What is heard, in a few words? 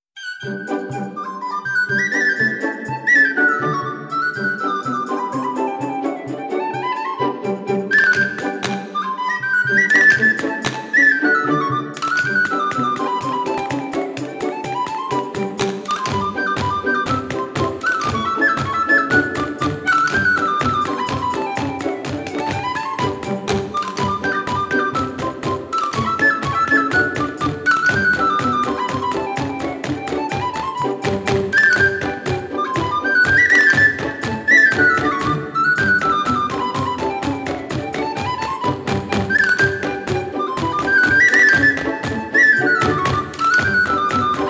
Orchestra Hornpipe; Orlando Philharmonic Orchestra